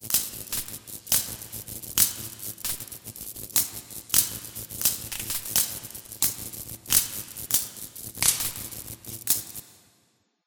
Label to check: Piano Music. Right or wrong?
Wrong